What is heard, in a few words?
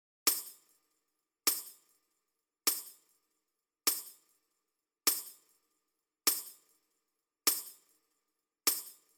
Tamb Stylus